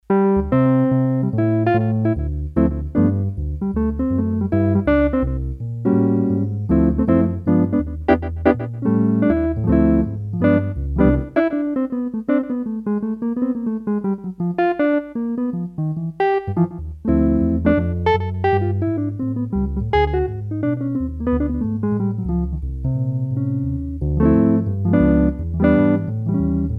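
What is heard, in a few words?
Jazz Guitar, Korg DS-8; Factory Patches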